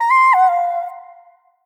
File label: blues queen sing; Crypt of the NecroDancer